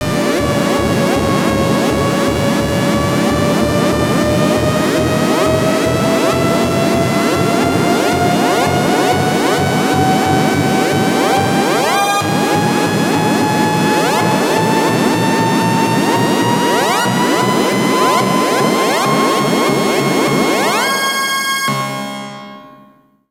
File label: HO FREAK OUT, SYNTH; Keith Emerson Signature Series